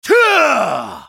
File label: spellcast effort; BlindMonk